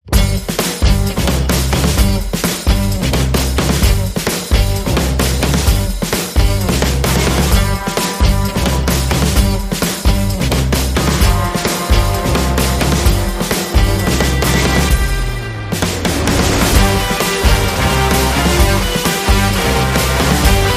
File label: Light Percussion